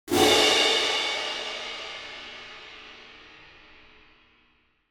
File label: Cartoon comedy cymbal